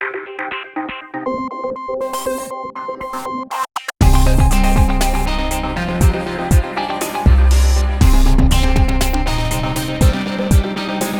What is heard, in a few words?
Bgm dungeon dustcity